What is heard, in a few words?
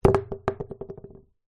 food drink empty take away coffee cup down on surface